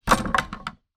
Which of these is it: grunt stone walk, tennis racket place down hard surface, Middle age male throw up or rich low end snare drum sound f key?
tennis racket place down hard surface